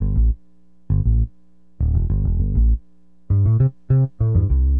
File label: BASS, Acid Jazz Funk